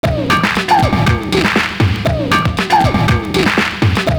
SIMMONS TOM, LOOP; Univers Sons

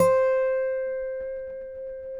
Finger C 5 L, Guitars; Spectrasonics; Hans Zimmer Guitars VOL-1

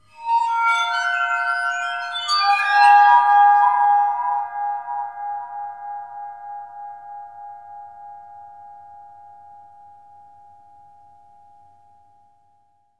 WATERPHONE M; ILIO; Synclavier Percussion 2; World & Orchestral

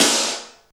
SNR, Tekno Industrial; East West

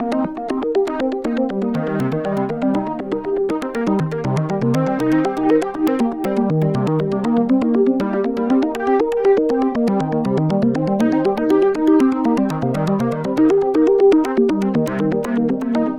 Long Arpeggio; Dr. Fink's Funk Factory

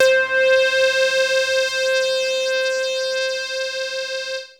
303-Style single hits

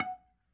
F#3 PERC, B3

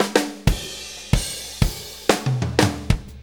Blues Fill; Sonic Foundry (Sony Creative Software); Loops & Samples Drum Tools; Fills

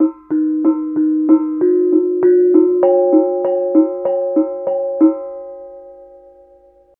KENONG; Spectrasonics; Heart of Asia; KENONG PH

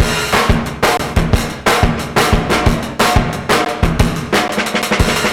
Fast n' Bulbus 180 bpm, breaks; Zero-G Beneath the Planet of the Breaks

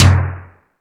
More Toms, E-MU Pro-Cussion

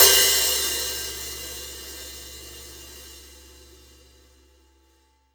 HH OP, Drum Dry; East Collexion; Drum 1

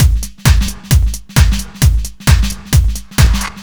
132 Full, Drum Loops 132bpm; Zero-G; Soundclash Nu Dance Electro; Acidized Wavs; Plastic Man

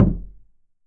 KOO KU MU, KOREAN DRUMS; AKAI S6000 CD-ROM; WorldSounds

